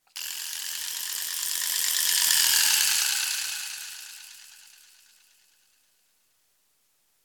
Strange Appear

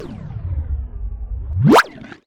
Pitch Bend Dog Bark

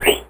Annoying bird

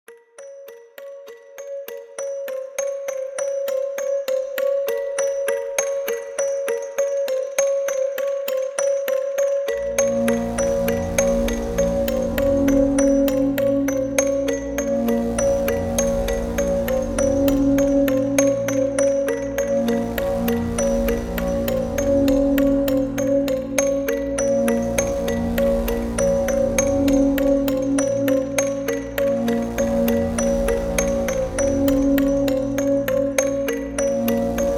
Creepy Background Music

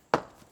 Rock Thrown At Wooden House

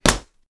Slap Hit

